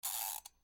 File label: winding alarm clock